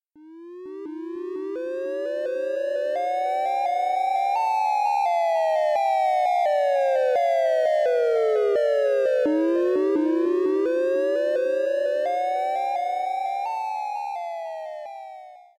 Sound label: Storm Alert